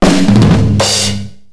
drum solo; Max Payne; ragnarock

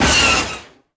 laser, weapons

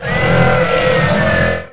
scream; fab Verm

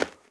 footstep run dirt

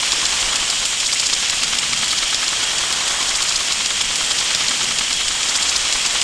sprinklers, water; Tony Hawk's Project 8; XBOX

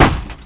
hit, surface; WhiteOut

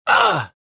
choke

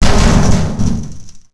Metal hollow crash; breakable

